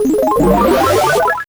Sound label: Sound effect of "Mega Power-Up"; NSMB